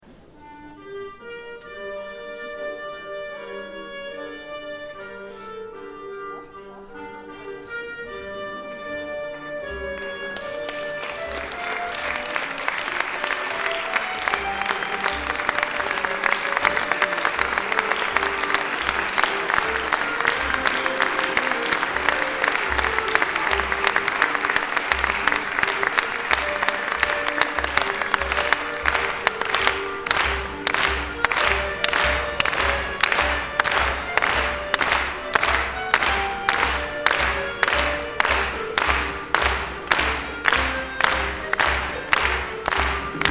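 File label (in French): Ensemble de Clarinettes; OHVN; live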